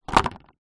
household dustpan and brush plastic down on ground